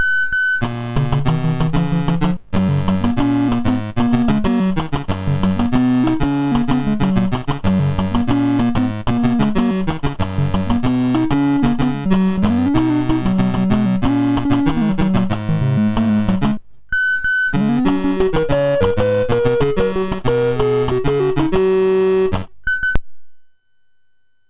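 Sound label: MOS 6581 SID chip music, games; Playdays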